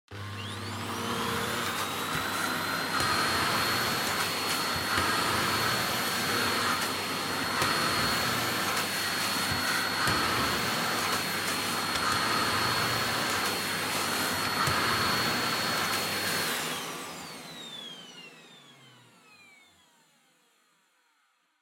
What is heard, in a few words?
Vacuum Cleaner Vacuum Floor Wood High